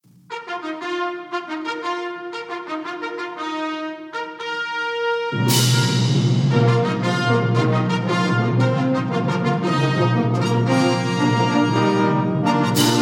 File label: fanfare; medalist